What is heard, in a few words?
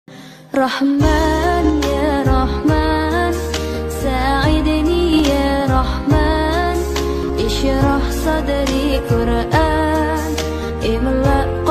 bangla new islamic ringtone